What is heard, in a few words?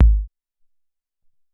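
Tek Kick, Alesis SR-18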